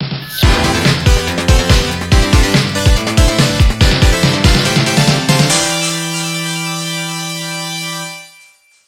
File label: goal; opensurge